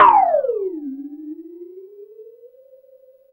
Synth Perc; Dream Zone

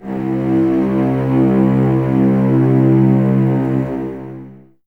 CES C 2, String Ensembles, Cellos, Expressivo; Miroslav Vitous

